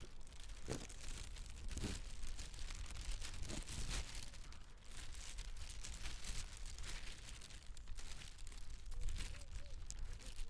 Carrying Plastic Bag With Keys In One Hand

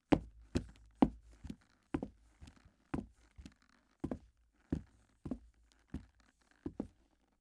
Hardwood Footsteps Fast To Slow

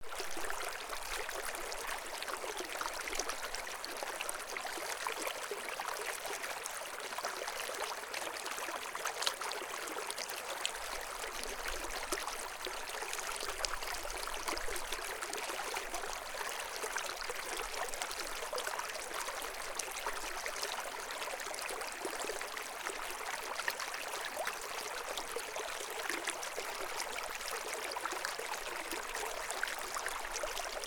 Water In Swedish Elv

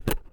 Picking Up Something Metal

